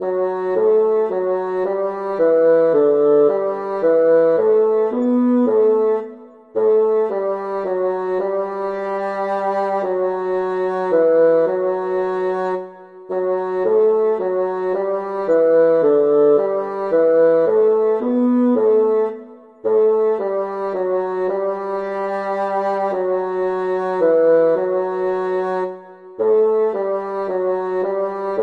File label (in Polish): tenor com przyrzekl bogu